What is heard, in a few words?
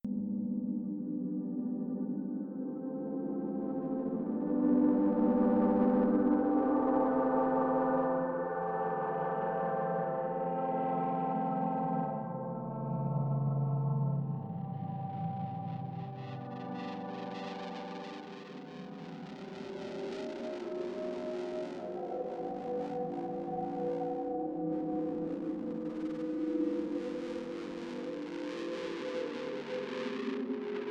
noise